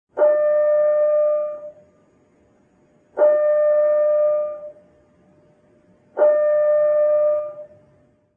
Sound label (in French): alarme sous marin